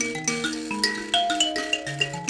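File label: grand mbira phrase, African; Roland LCDP11 Africa VOL-1; M'biras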